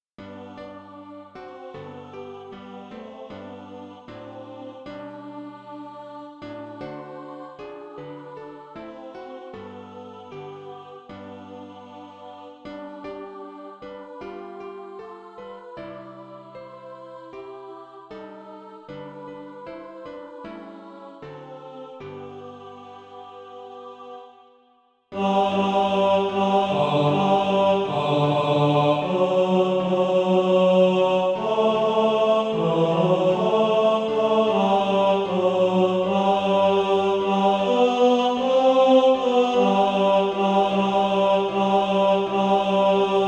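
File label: tenor, Practise Music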